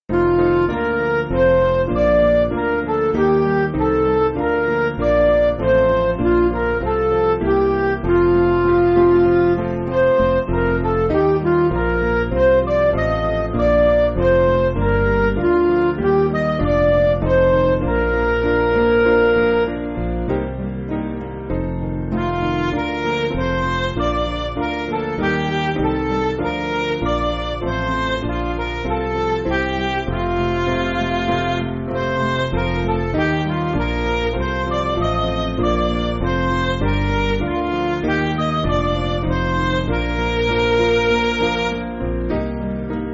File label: Midi